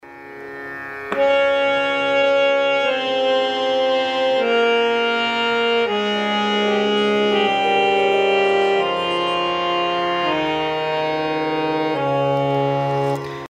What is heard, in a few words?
Avaroh Yaman